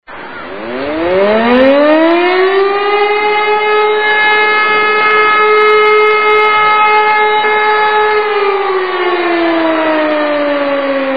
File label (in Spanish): alarma